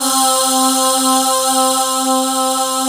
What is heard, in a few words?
choir C4; REBECHOIR; Synth